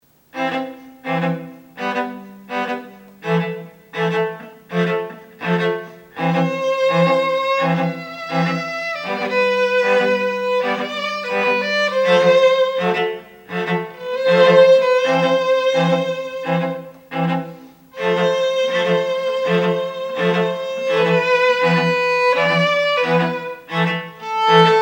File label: Contemporary String Ensemble